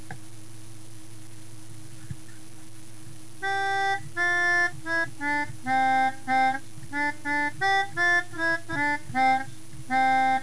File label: melodion